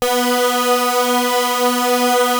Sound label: Prophet 5 B4; Hollywood Edge - Giorgio Moroder Rare Synthesizer Collection